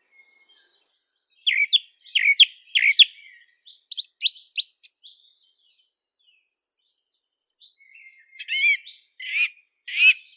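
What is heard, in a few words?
song thrush, turdus philomelos; drozd pevchii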